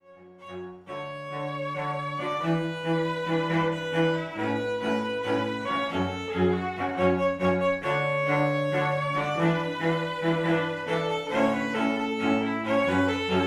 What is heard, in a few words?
Trio, string trio